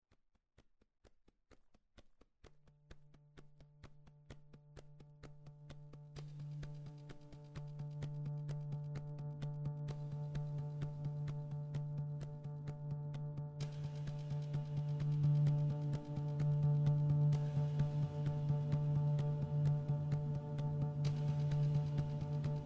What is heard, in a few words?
train musik; life is strange